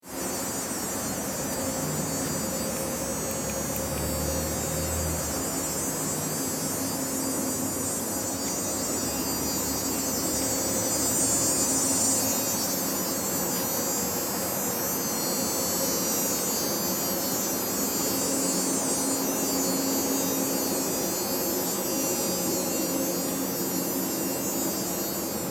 Bahn Elektrosummen

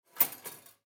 Kueche Toaster

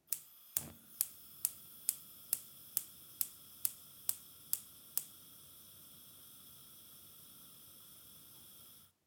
Gasherd

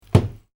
schliessen

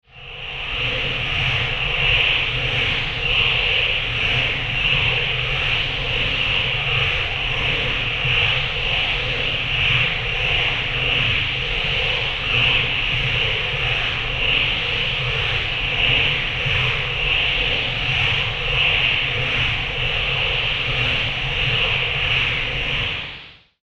Ei im Jet

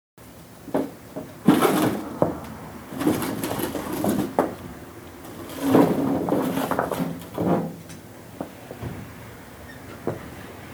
Schaufel

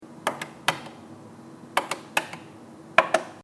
Geldautomat Eingabe

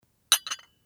Espressotasse

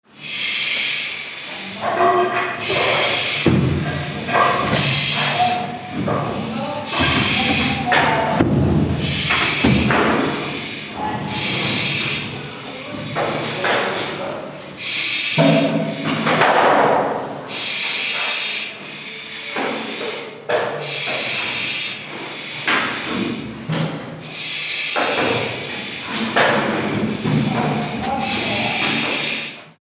Feuerwehruebung Atemluft

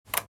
Luefter

